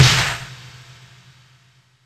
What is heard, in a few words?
IND; Zero G; Man Machine